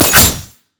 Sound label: equilibriumstrike; LoL; irelia